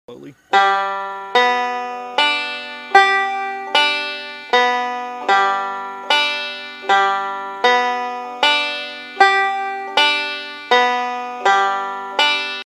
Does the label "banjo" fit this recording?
Yes